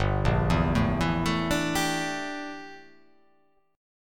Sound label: G Minor 6th Add 9th G down